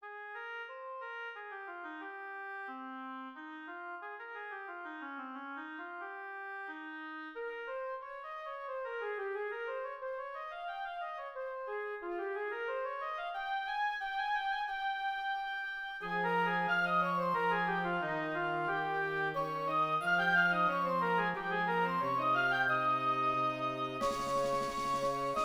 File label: Angels Sound D